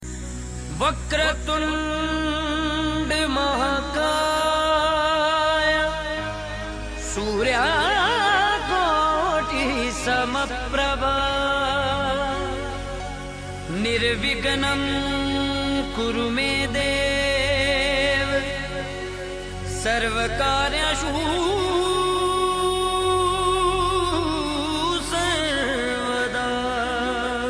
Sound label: Vakratund Mahakay (Male); Ganesh Chaturthi Special Ringtones